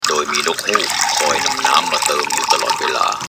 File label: cartoon